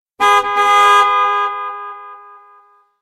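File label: gudok mashini, car horn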